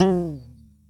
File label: pluck; crowds; NotDotTeam